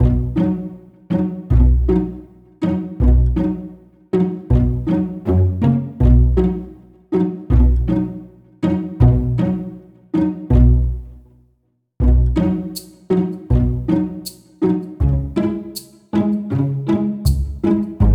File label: bgm